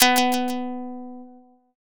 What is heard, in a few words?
shamisen